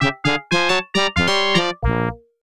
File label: Bonus Jingle; Pink Goes to Hollywood; genesis